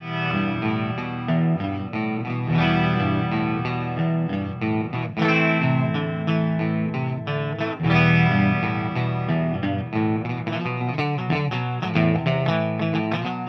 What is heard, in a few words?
ceriatone 2204 brighton clean